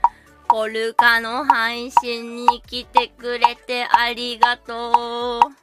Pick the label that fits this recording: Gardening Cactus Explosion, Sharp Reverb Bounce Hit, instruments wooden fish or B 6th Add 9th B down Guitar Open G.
instruments wooden fish